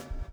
SLICK, Backbeat

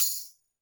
percussion, Roland TD-20

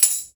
Tambourine, Percussion

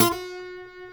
G, Guitars; Spectrasonics; Hans Zimmer Guitars VOL-1; MARTIN HAMMR

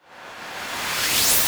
Reverse

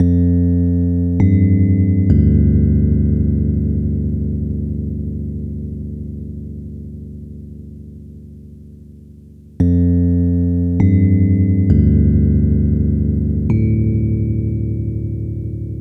E Piano, hip-hop; Sonic Foundry (Sony Creative Software); Hydroponic Hip-Hop; Keys Synth; Pianos

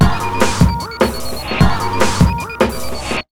150, Big Beat Drumloops; USB Soundscan vol.25; AKAI

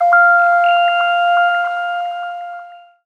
synth note tiny friend F; Virtual Riot; Synth Notes & Chords

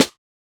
Snare, JUNGLE BREAKS

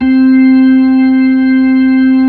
B-3; Roland LCDP10 Keys of the 60s and 70s 2; Full Xfade

